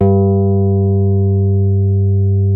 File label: SIDE MID G2, SYNTH PLUCK